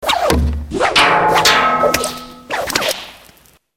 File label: WHIPS